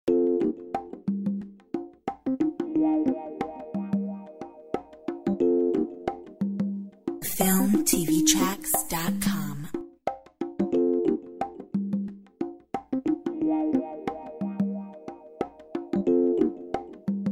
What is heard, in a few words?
Groove; FILMTV-TRACKS